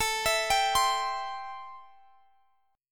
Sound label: A-Minor 7th A; x,x,19,21,20,20; arpeggiated; Am7